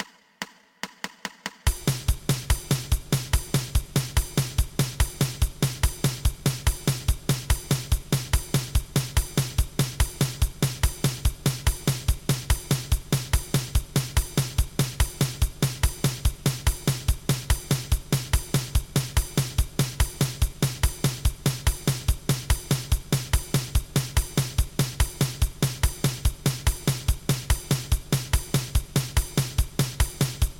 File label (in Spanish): 2 pulsos